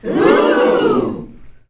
OOOOHH; ClapsOohsAahs; SFX

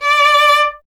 STR VLN, Vln Detache; Miroslav Vitous

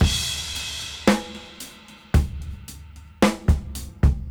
Half Time Loop 55 BPM; AMG - Keith LeBlanc Phat Butt Jams

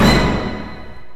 ORCHESTR HITZ

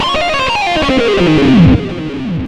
xtra lead

